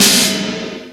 Snares Electro

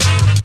horns

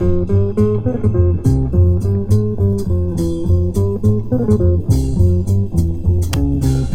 bass, Loops Phonky

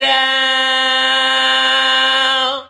organ

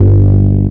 fuzzed, Bass; Notes

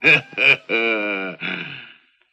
laugh kung fu; ooh ahh

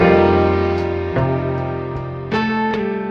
piano, Loops; Phonky

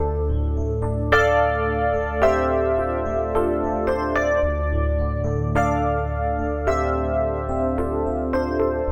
keys, Sad Loops

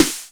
snares, electro

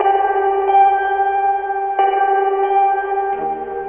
guitar plucked in sorrow, Loops, Sad

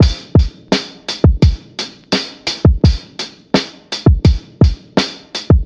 hoohaa, Drum loops Boombap

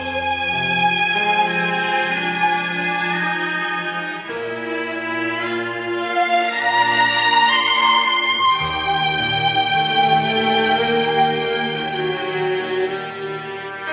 strings, Sad

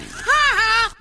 laugh

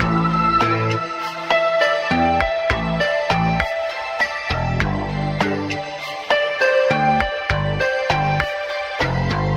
robot gangsters, loops electronic

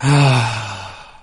"ah" relief, Voice; ooh ahh

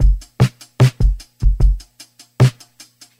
Drum loops, Boombap

